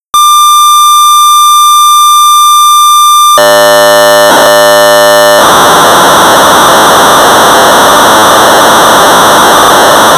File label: Sorcerer 1200 baud; Sorcerer TZKIT Relocator